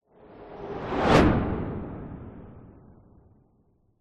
spaceship or rocket fly by fast

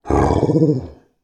horror werewolf vocalisation

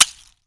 nature ice shard smash on ice surface

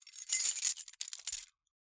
Keys Handling

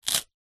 human eat bite crunch crispbread slice

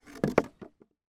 wood post drag across wood panel; foley

